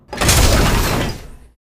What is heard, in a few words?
bt disembark; Titanfall 2